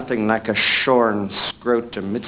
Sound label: light saber